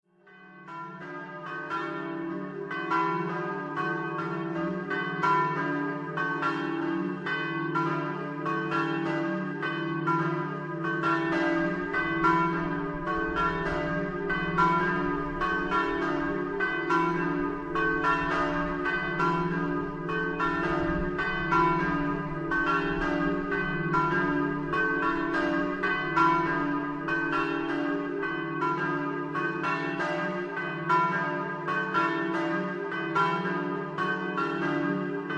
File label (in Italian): campane; CORNO DI ROSAZZO